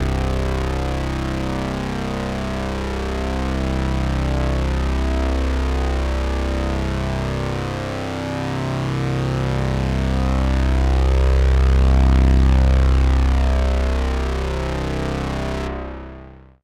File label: Oberheim OB-8 BASS - C; Synth; One Shots; Incredible; Cymatics; Fantasy Synth Sample Pack